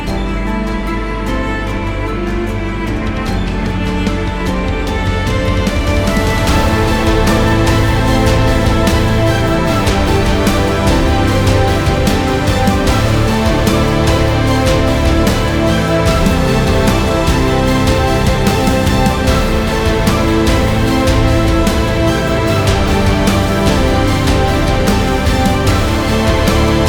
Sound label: victory theme